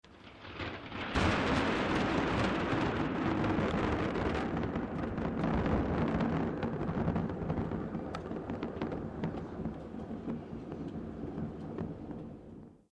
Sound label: thunder